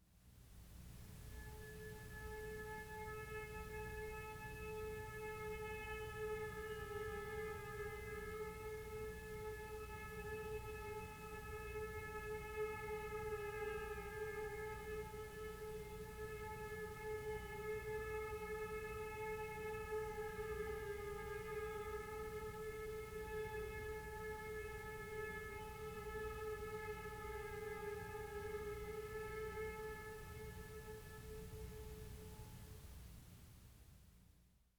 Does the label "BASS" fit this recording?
No